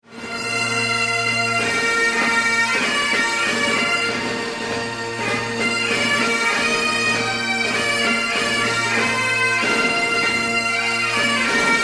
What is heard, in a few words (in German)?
Schottland Hymne; highland games